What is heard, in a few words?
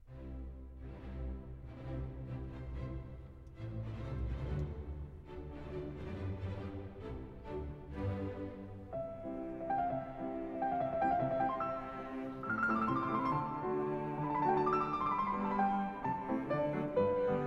Piano Concerto No. 4 in G Major; Op. 58; Beethoven